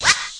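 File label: reverse swipe; drums single hits; Weird and Interesting Hits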